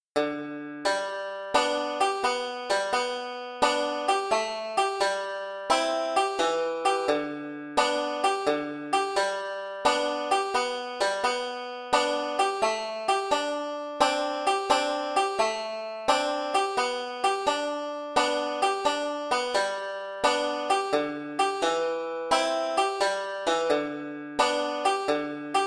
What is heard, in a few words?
amazing grace banjo solo g c d; beginning 5 string